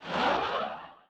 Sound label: mail sent